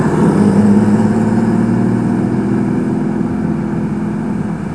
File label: Carrera 3L, Porsche 911; son